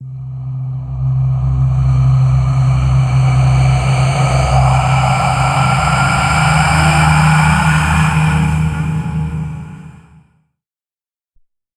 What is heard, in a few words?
death bleed; Identity V